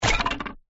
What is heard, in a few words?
blocker destroy; gardenbloom